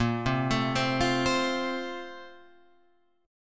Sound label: Bb-Major 7th Suspended 2nd-Bb-down-Guitar-Open F; BbM7sus2 arpeggiated; JGuitar